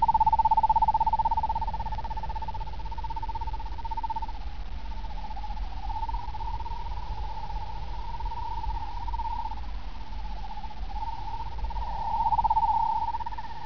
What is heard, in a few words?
CRICKETS